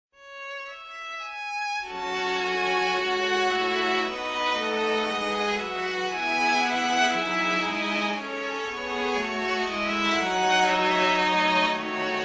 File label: Violin Sad